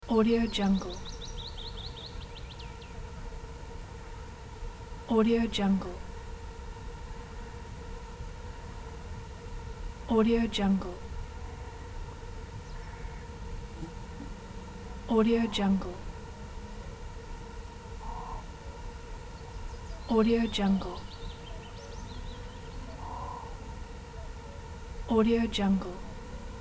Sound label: beach lake insect flock birds voices